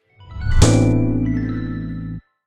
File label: Defeat Jingle; Smashy Road Arena android ios 2016